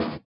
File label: SWUSH; Spectrasonics; Metamorphosis